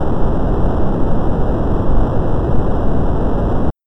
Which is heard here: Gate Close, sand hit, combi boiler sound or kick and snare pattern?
combi boiler sound